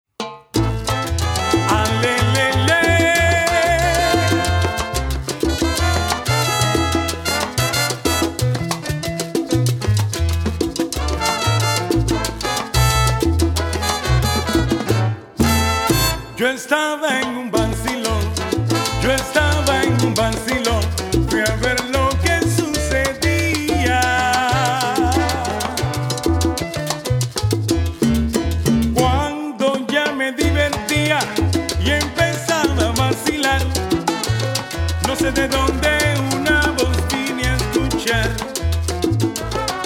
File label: salsa